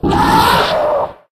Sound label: hit, monsters bloodsucker; Soundscape Overhaul